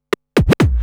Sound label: Scratch Fills 140BPM